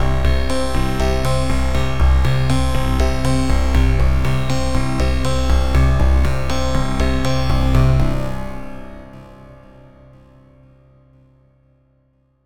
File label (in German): wavetable-synthese; klangsynthese